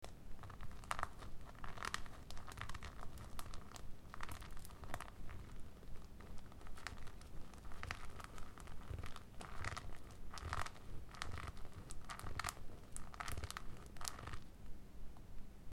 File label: crunching cherry